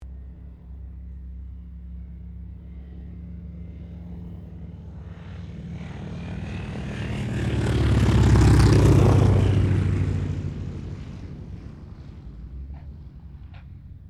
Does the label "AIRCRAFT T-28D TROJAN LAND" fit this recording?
Yes